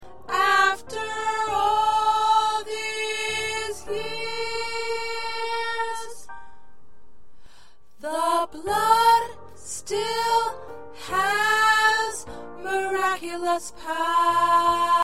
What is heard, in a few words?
Alto, choir parts